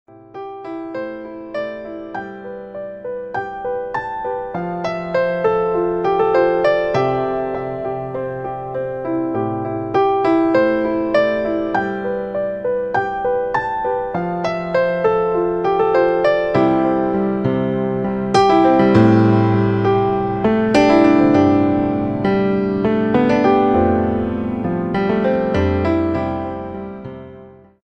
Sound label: romantic piano ringtone instrumental ringtones